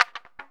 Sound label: GTR RIF; E-Lab; X Static Goldmine 2; akai